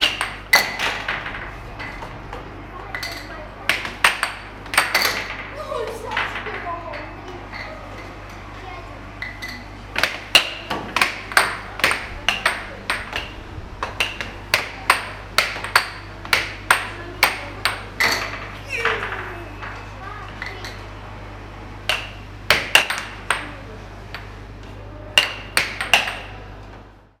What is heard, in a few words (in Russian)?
aerohockey